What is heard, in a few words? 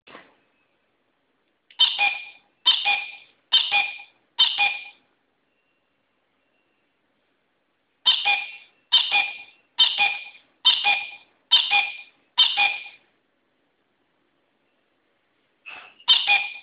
South America bird